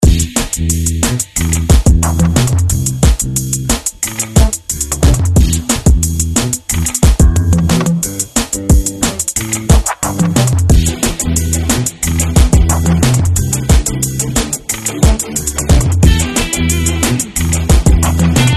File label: Rock Ringtone